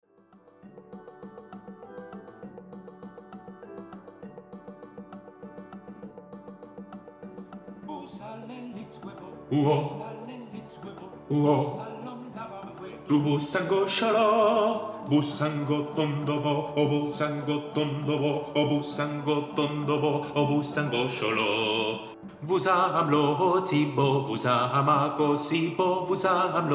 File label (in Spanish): bajos; REYLEON